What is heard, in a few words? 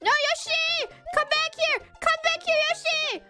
Scream Come back here; IRyScream; Yoshi